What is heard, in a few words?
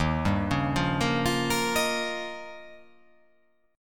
D sharp 9th D sharp